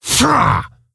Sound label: Stonebark Attack; Elder Stonebark